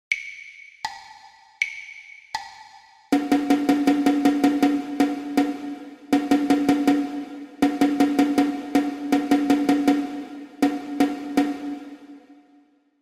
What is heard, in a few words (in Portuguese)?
percepcao ditado ritmico